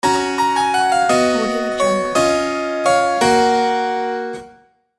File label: classical harpsichord transition